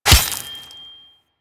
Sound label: Melee Sword Impact Flesh; Warframe; Weapons; Swords